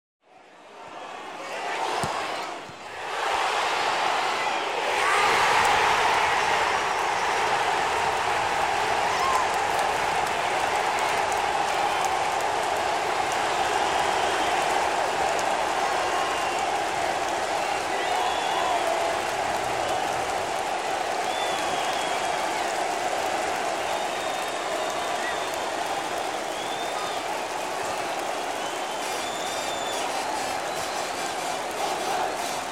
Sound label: Sports Crowds - Goal Scored; Human Crowds, Children & Footsteps